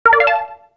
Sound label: RED COIN; YWW